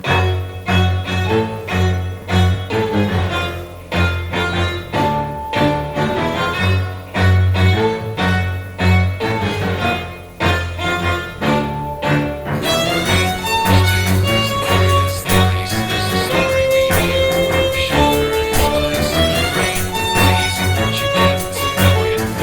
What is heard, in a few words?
Drum Violin Cello Cover